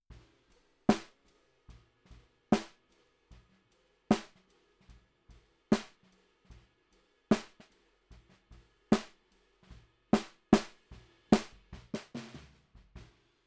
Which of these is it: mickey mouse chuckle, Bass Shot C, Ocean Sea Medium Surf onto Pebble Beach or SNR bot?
SNR bot